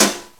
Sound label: snare alt; osu